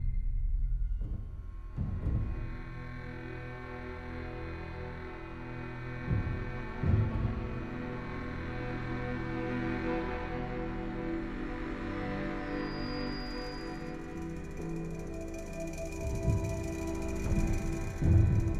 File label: score Desert; Journey